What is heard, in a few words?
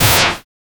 rhythm game attack sound